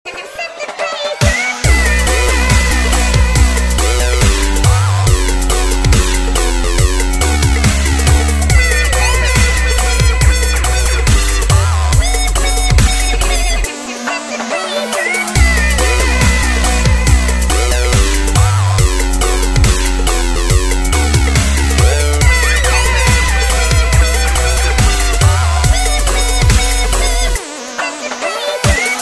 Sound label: retro dubstep